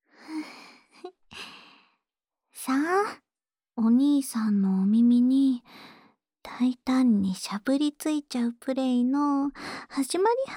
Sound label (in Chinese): 唾液較少的舔耳 右, ASMR